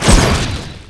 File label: attack; dota; ancient apparition